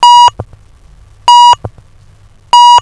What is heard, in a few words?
tone